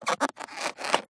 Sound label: cartoon rubber squeak